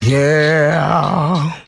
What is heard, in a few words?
"yeah" SUNG